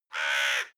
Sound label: crowing; natur-tiere; voegel